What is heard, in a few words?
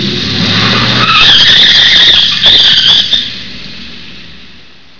Screech; Home Repair